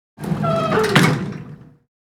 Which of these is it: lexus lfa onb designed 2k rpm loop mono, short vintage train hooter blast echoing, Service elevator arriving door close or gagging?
Service elevator arriving door close